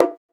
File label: Percussion, House